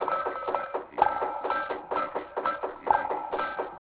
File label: perc loop low; cymatics